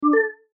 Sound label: message